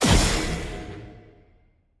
enemy boss teleport in; bloom minigame

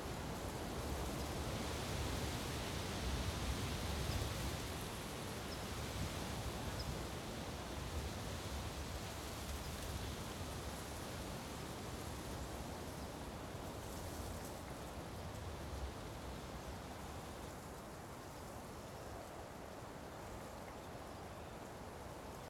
Wind; Emitter

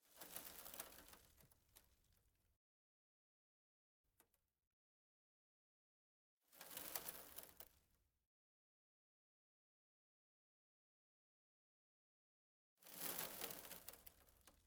Dirt Debris

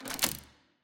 Lock Dial Down; Puzzle

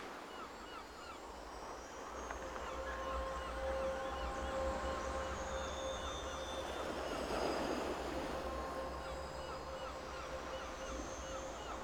amb beach; spirit blossom

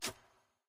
generic notif box appear

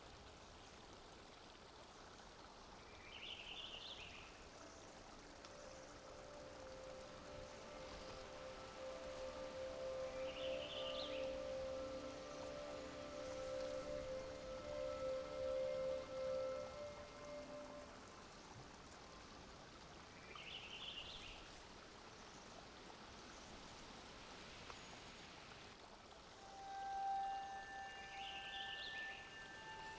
amb spirit forest; spirit blossom

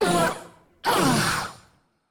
Enemy Boss Death; bloom minigame

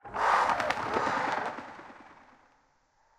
comic crow caw

